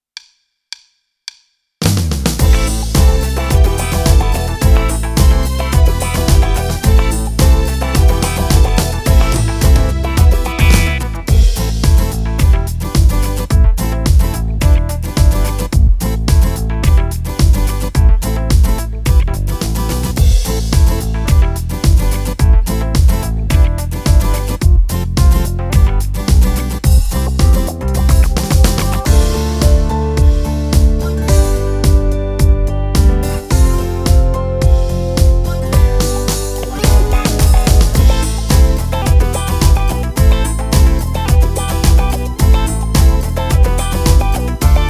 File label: Beat